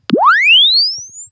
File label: press one finger Chirp; Samsung 4 Recordings